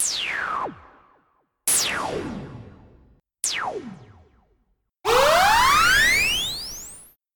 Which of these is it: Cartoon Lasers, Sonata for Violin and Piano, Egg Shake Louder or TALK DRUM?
Cartoon Lasers